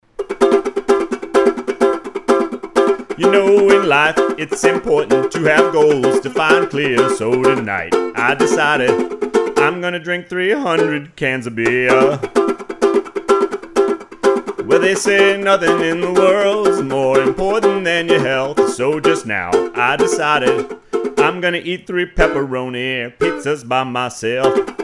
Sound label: ukulele